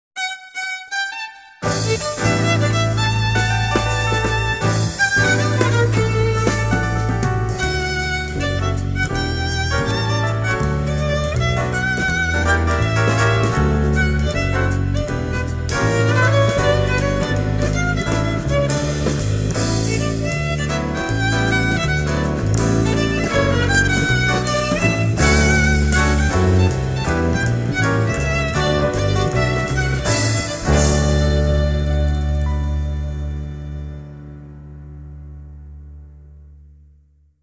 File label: Hymne jazz